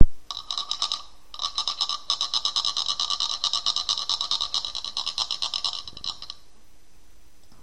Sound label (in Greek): ξύστρα